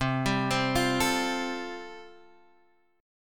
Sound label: C 6th Suspended 4th C, chord